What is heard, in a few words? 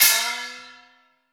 CHINESE GONG; AKAI S-Series CD-ROM Sound Library VOL-5